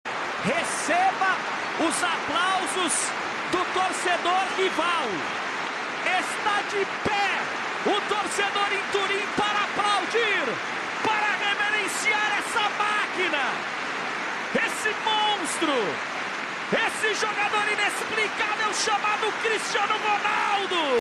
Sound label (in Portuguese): receba os aplausos do torcedor rival